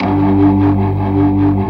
G1, Keyboards Old School

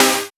Synths Root C

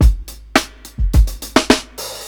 105 BREAK, DRUMS LOOPS; BASEMENT DRUMS VOL 2

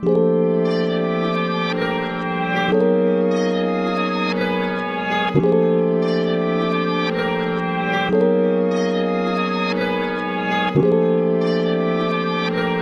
Melody, Hip-Hop

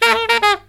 SAX

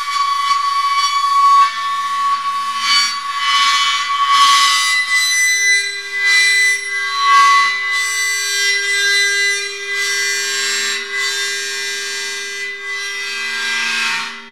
BOW CYMBAL, BOWED CYMBALS; Zero-G Ambient 1

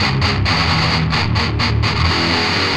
Riff, Industrial; Nasty

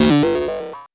MOOG HAT; AMG - Vince Clarke Lucky Bastard

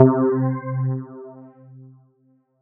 SY PHASOR; ModelCycles

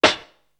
boogie, snares; Zero-G - Funky Elements

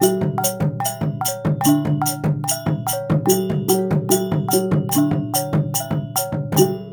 WYANG L, Heart of Asia

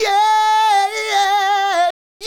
Adlib, Hip-Hop and RnB Vocals

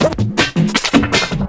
LOOP L, Drum & Bass 160 BPM

